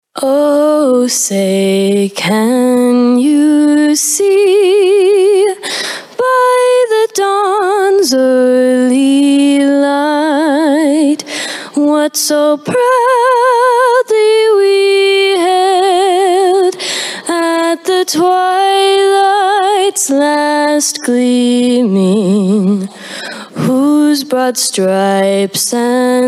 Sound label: sings National Anthem